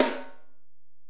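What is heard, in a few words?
Acoustic Snare, Alesis DM-PRO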